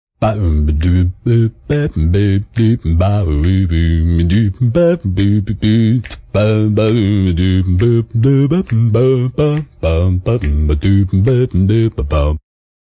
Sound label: Sing Jazz Scat, Male, Jazz scat, slow, singing, vocalization, bass, song, (human voice); Sing Jazz Scat2